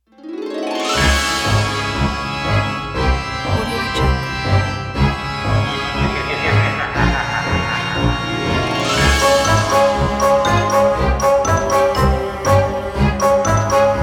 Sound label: halloween kit; stereo jam